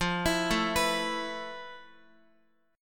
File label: F Major Sharp 11th, Guitar; arpeggiated